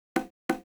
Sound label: Congas, 090 Cmin; Hip Hop; Hip Hop Exotica; Apple Loops; Big Fish Audio